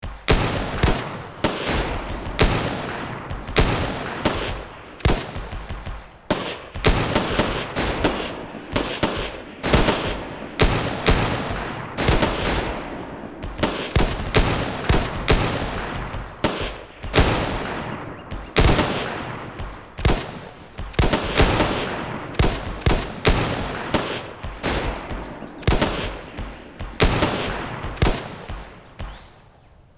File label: Sniper shooting in the distance